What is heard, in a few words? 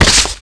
bail scrape; skater; Tony Hawk's Project 8; XBOX